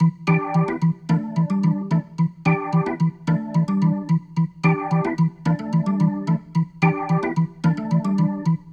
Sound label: Rotor Riff, Organ